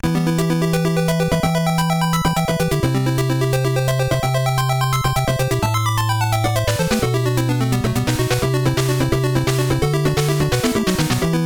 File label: Match Lost; Toukon Club; NES